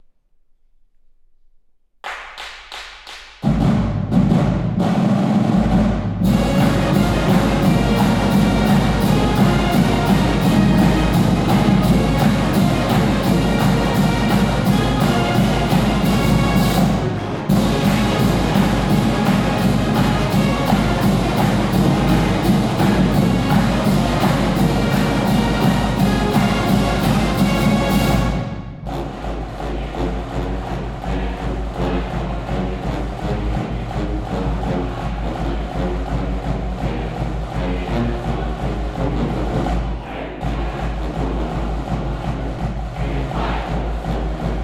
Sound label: fight song, marching band